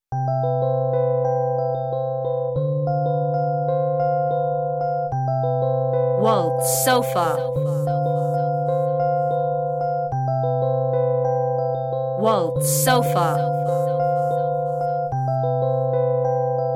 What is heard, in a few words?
Waltz